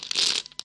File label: SEND CARD